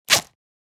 Money pouch zipper; purse

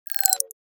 Futuristic error

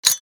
Grenade safety pin pull; military equipment sound effects

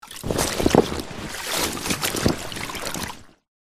Lilo inflatable mattress person movement in water

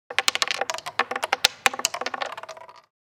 Casino game roulette ball bounces and stops

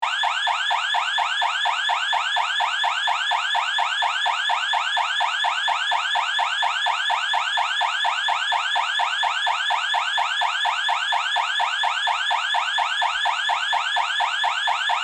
House alarm ringing intruder siren loopable

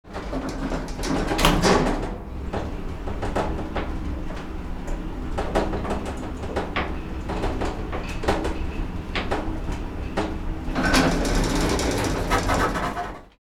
Service elevator moving moderate clanging

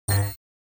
Futuristic button

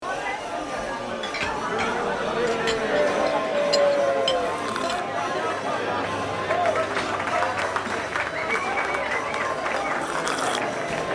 Tavern ambience medieval people chatter and music

